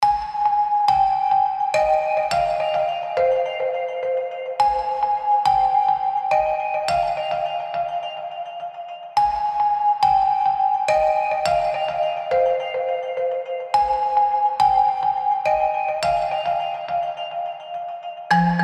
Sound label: Marimba Pulse; Noisebiscuits